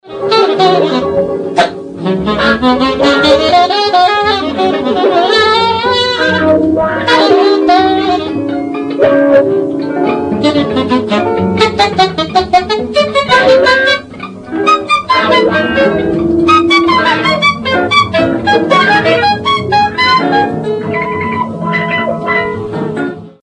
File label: strings